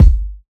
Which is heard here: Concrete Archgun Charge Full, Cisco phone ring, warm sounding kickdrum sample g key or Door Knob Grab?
warm sounding kickdrum sample g key